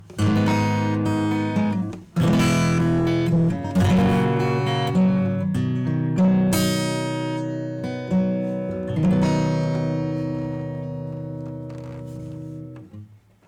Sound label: acoustic guitar; ER ACC GUITAR magic